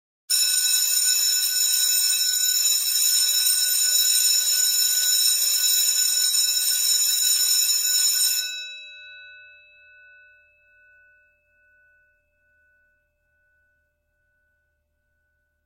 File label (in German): Schulglocke